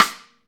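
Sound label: snare drum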